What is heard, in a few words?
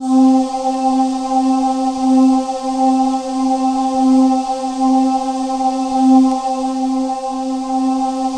C4, Synth Leads